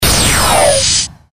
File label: burst